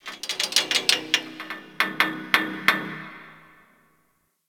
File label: Ocean Medium Metal Creaks; Warframe; Grineer Ocean